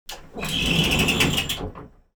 transportation train intercity train hydraulic slide door